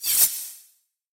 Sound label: anime sword swipe down